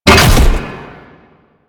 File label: Thumper Bot Jump A; Warframe; Grineer